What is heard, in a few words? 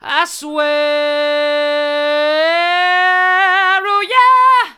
i swear hard, vocal